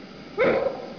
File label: elk cow bark